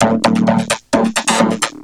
Vocobeat; Sonic Foundry (Sony Creative Software)

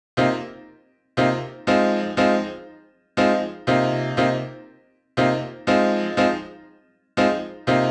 Chord Piano Cm, 120bpm; Zero-G; Extra Chord Progs

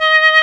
VIB FLUTE D2; AKAI S-Series CD-ROM Sound Library VOL-2

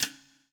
POWER RIM

